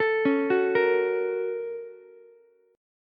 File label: Bb Minor Major 7th Sharp 5th Bb down Ukulele; BbmM7#5; arpeggiated; JGuitar